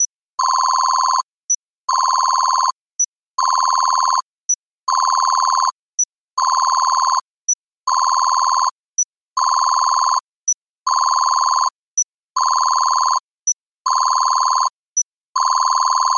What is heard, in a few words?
Ring Dial